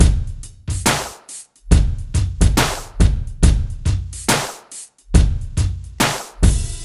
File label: SloMo Beats, Loops 140 BPM